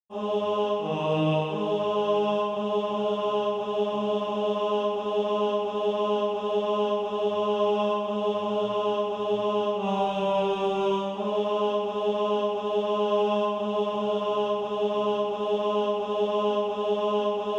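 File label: tenor, SATB Practise Music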